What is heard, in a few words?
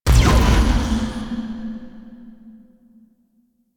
Corruption UI Debuff; Warframe; Missions; Zariman